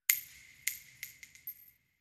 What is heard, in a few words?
Shell Case Sngle Revb, shell casing movement